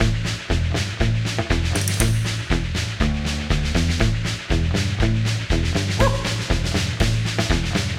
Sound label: feature Splash Music; wild wild west